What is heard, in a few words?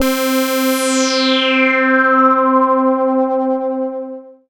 Dance Floor Bass, Multisample Basses